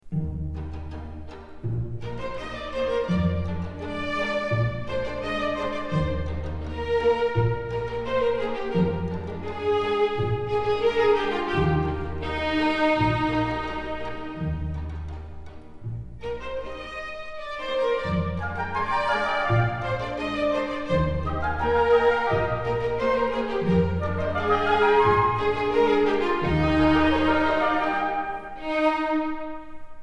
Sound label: Neapolitan Song